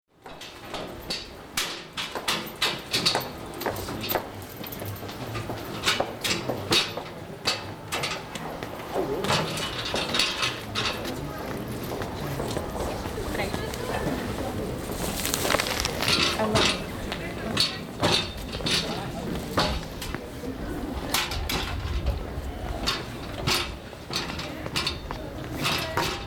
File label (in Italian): San Angelo; suoni